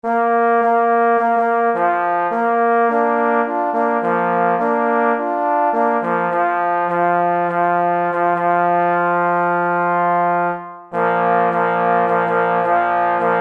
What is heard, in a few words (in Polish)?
Pobudka na 3 parforsy; Pobudka spalska